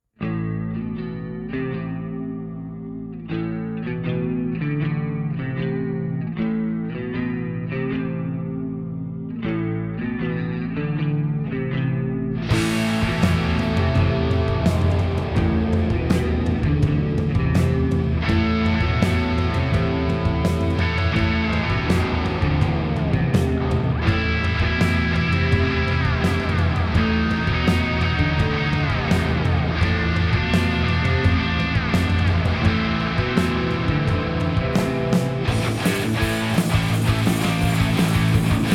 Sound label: Instrumental Mix